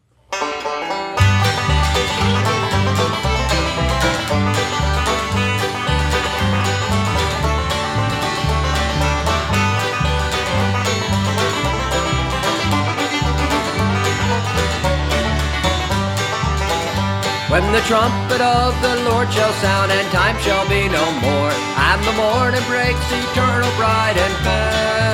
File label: Bluegrass Chapel Band; When The Roll Is Called Up Yonder